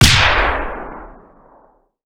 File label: expl; desert racing